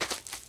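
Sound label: step grass right; yo frankie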